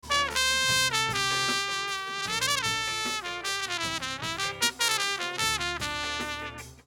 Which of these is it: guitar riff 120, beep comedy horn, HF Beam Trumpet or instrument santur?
HF Beam Trumpet